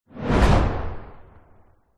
sound design sweep transition hard hitting whoosh dark; zapsplat